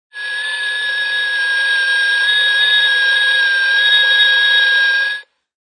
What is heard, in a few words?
Toy Tea Kttle, Toy kettle whistles.; Children; Human